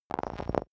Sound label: sci-fi electronic damaged movement heavy